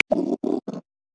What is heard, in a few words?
Common Brushtail, Australia; Xiaomi Redmi-Note-8-Pro